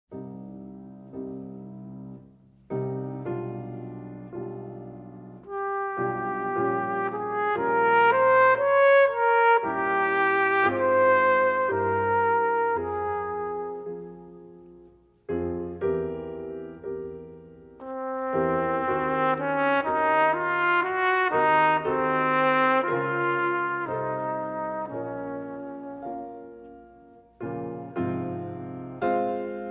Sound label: Sonata For Cornet & Piano - Mvt. 2. Andante con espressione